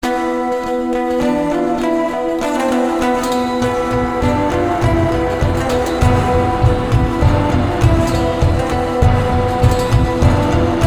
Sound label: Tension Mongolian Without Voice; Real Warfare 1242